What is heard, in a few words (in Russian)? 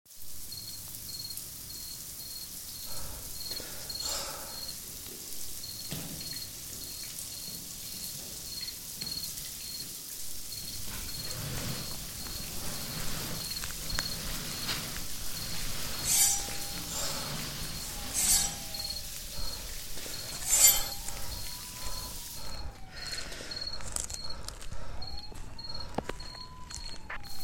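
звук заточки ножа бега и выстрела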